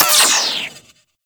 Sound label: Cannon Mech; Warframe; Weapons; Archwing